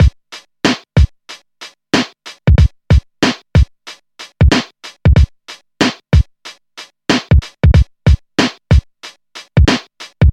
93 bpm drum loop G sharp key, kick tuned to the G# note; drum loops